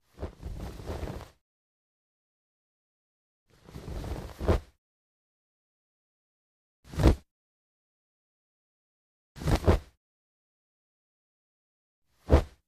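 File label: Cloth Flaps Heavy Light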